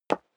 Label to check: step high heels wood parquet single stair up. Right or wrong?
Right